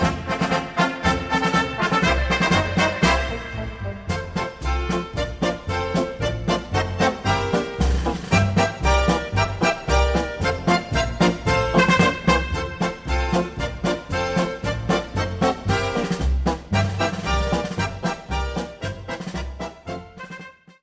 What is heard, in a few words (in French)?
Polka - Allemagne